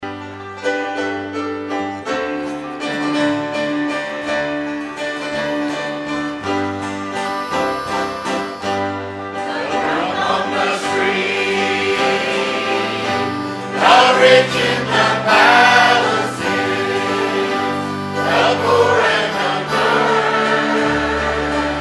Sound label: Choir